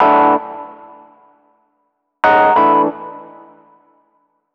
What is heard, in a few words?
095 Acid Jazz MarkII Ebmaj; Piano; E-Piano; Pro Tools Essential Loops; Digidesign